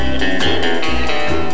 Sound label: bond guitar